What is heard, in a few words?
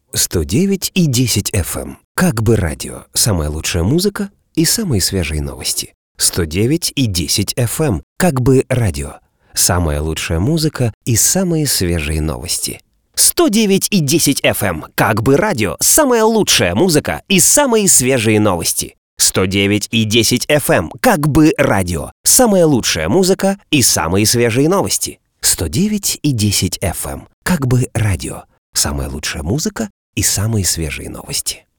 Jingle